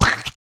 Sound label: SPLAT